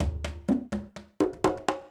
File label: PERCUSSN ETHNO 125, Drum